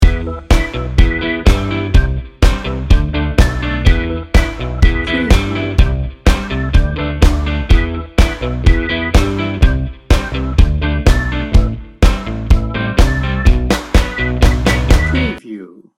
friendly fun loop

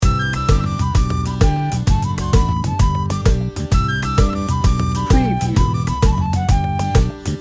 irish jig loop

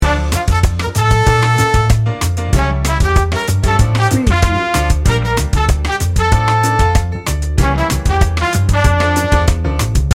miami holiday loop

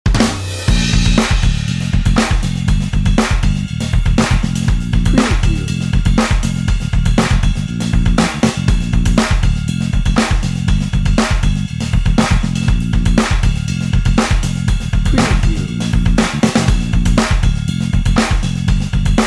happy feet

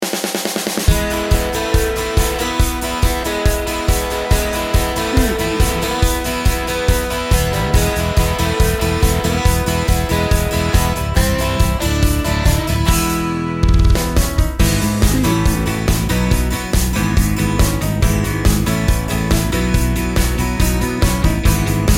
showtime rock bed

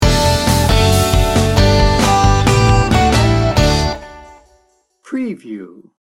americana home sting bumper

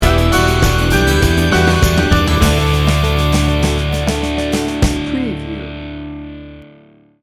americana morning sting bumper

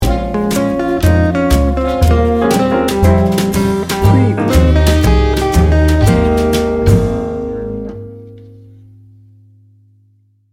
light jazz guitar sting bumper; Laid back, edgy blues. Featuring a signature acoustic guitar riff

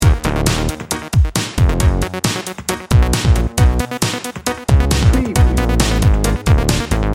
energy bounce loop

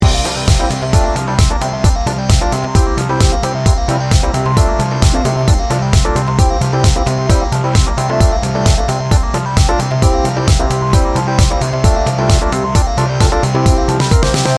fist pumper loop

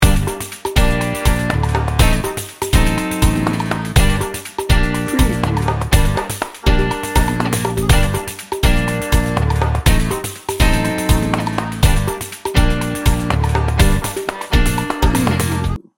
tango loop

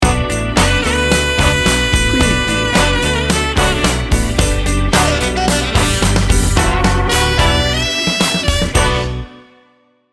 neo soul sting bumper

